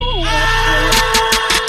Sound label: SCREAM